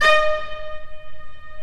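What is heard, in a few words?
Viola D#4; Digital Sound Factory Virtuoso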